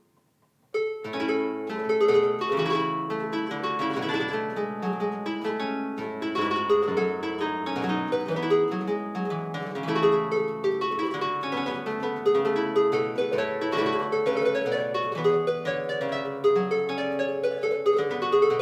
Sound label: Suite B flat Courant, harpsichord; Johann Pachelbel - Courante (Back 8') - Performed by Sebastian Bottone; Sorli Harpsichords and Lautenwercke